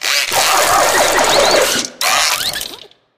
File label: eggbot self spin